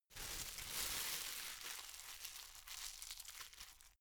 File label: plastic bag rumble slow